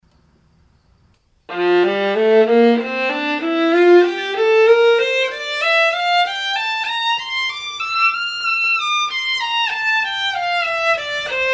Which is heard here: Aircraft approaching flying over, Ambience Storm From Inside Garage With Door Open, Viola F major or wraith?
Viola F major